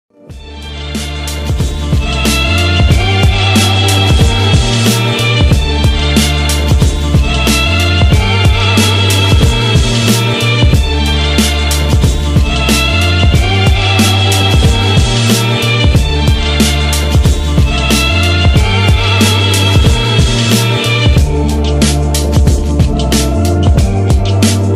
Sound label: instrumental